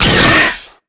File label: pain, headcrab black; creatures; vox